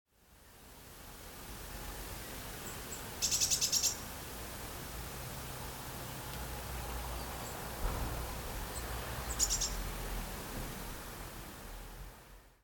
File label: Cyanistes caeruleus; SETE